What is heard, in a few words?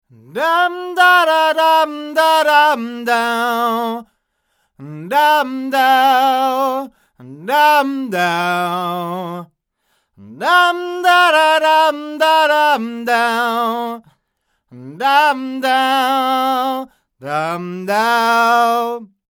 Mojave MA301fet S2 far